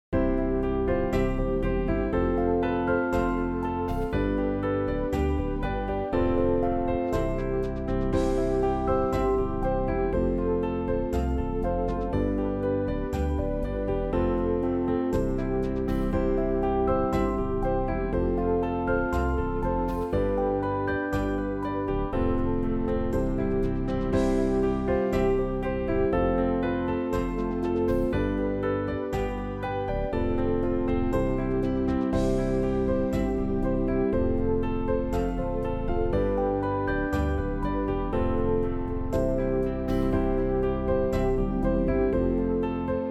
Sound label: backing; Accompaniment; New Hope Music